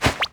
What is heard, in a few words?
distraction; crab